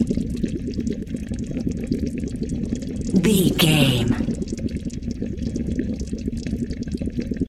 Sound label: water bubbling loop